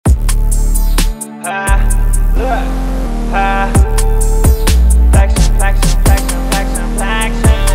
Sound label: beat